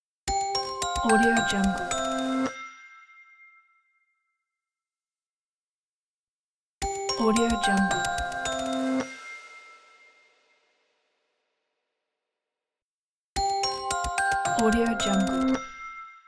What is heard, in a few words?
bright corporate logo; antonshilo